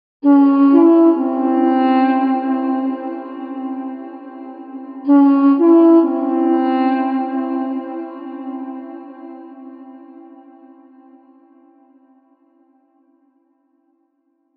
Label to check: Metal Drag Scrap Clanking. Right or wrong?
Wrong